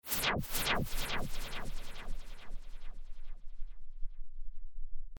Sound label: Pink Noise Effix Delayed; Techno